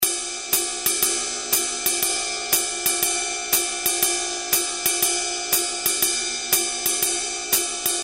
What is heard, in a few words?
SWING, Roland R-8